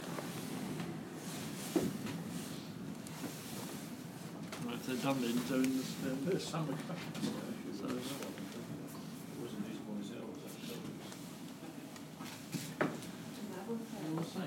in a bird hide at rye harbour nature reserve, bird nature reserve; BoxingDay; wind